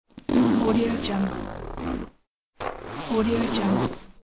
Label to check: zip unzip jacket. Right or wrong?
Right